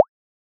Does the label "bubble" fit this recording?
Yes